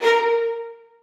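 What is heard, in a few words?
1st violins staccato a#4; Sonatina Symphonic Orchestra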